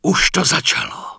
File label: Night Elf Male Cheer; wowpreklad; czwow; NightElfVocalMale